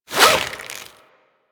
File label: Sword And Whip Pull Back; Warframe